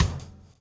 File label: DOWN, DOWN KIT 78